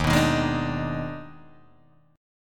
D sharp Major 7th Suspended 4th Sharp 5th, Guitar, Open G; chordshape